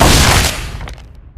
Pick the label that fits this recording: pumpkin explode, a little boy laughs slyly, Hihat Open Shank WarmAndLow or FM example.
pumpkin explode